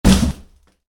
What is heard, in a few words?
Hit box, Box, Cardboard: Impact, Slight Box Movement; Miscellaneous Impact; Stuart Duffield